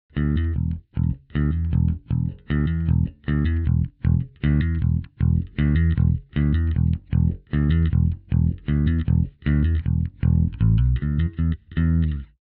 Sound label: bass; devil; Studio Devil